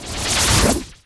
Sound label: Skill Stab; Vata Stab; Fly For Insanity